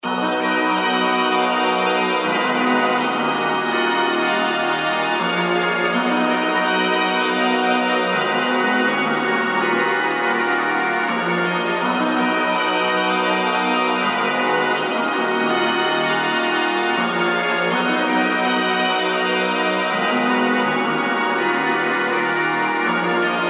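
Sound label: D minor 163 BPM Vocals Trumpet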